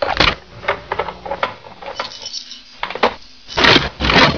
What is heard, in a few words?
minigun reload; soldat